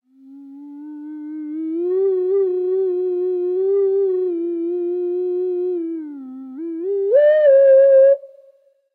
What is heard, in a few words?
Theremin